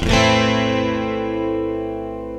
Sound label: GUITAR, ROCK POP; MAGIX